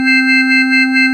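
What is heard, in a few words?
organ Vox C4, Continental, wah bar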